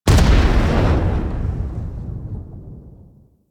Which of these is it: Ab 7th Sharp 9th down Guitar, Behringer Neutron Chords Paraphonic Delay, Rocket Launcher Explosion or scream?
Rocket Launcher Explosion